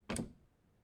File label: door push open